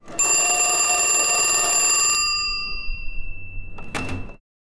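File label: ringing of the cash register, business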